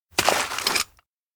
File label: jack insert rubble; anemoi